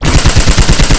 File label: Assault Rifle Shot Loop; SFX Kit; Weapons